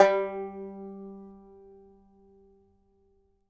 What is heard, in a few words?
banjo G3, banjo notes, country; Sampleheads; Whole Lotta Country 1 & 2